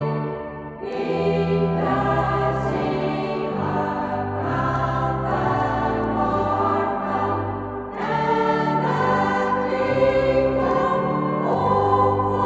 Koor dames; Schevenings vissersvrouwen

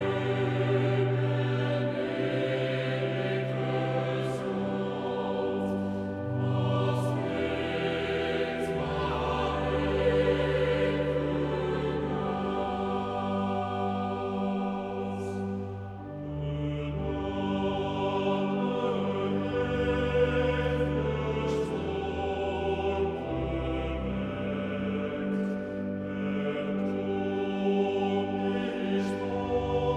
Koor gemengd

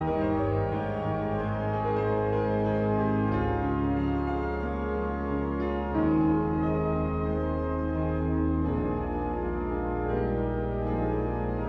Koor gemengd

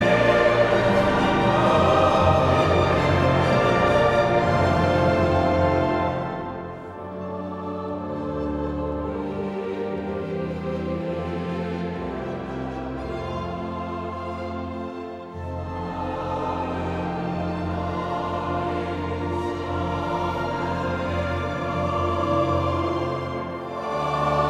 Koor gemengd; Christelijk nationaal

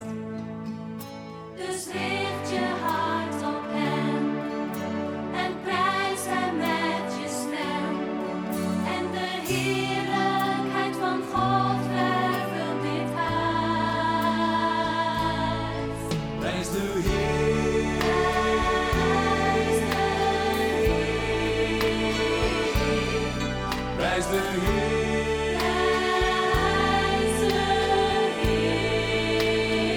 samenzang

